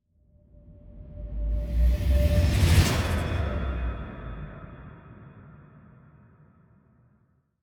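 Music Electronic, Electronic Transition Loading start; Forza Motorsport 6 Apex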